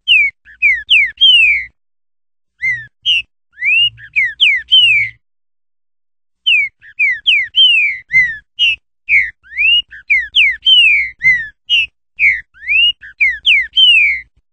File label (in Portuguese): Canto Trinca Ferro Liso